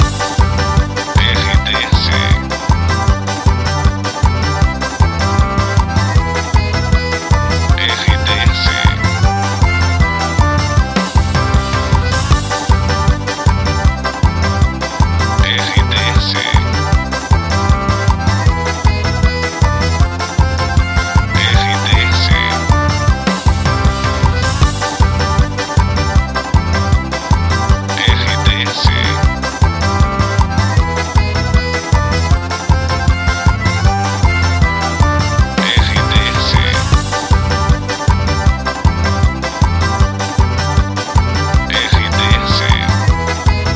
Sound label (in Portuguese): TRILHA RETRO FORRO; Hit Retrô; Imprima FM 2022